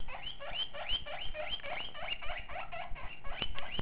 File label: alarm call, guinea pig